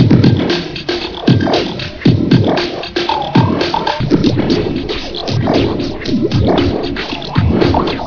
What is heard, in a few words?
zombie song; blackshades